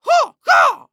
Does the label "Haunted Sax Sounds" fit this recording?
No